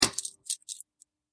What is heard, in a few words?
projector stop